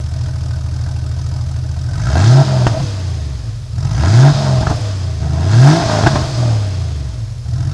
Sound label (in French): 993 pot Scart, Porsche, Accélération; Porsche 993 RSR